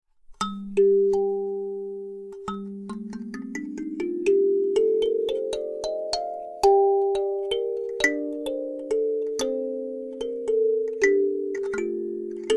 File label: Alto G minor, kalimba